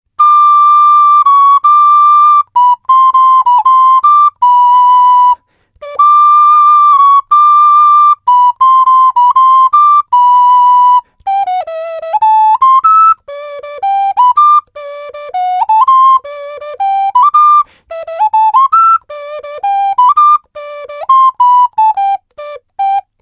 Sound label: Oc 6 hole Yellow Bird, ocarina